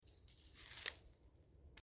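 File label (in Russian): легко хрустнула одна тонкая веточка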